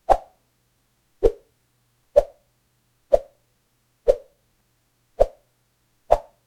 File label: magical whoosh soft pop; appear